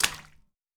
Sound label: Puddle